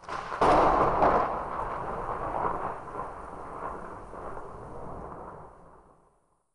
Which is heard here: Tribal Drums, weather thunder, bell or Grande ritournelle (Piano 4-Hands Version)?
weather thunder